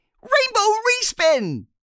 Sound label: rainbow respin; Racetrack Riches; megaboard